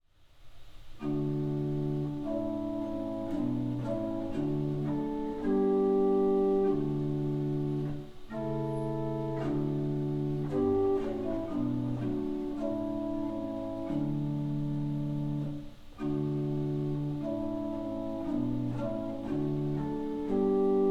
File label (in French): clavier Gemshorn 8